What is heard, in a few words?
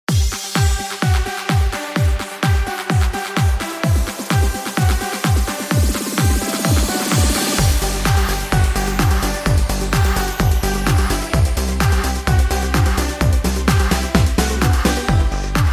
music